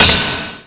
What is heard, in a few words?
grind metal on